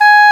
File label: Oboe D'amore; Roland L-CDX-03 Disk 1; Oboe 4-8